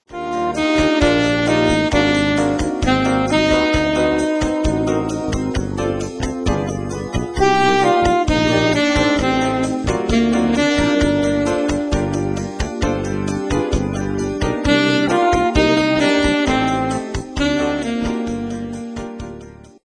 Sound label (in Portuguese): primeiro samba